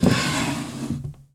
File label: opening wooden drawer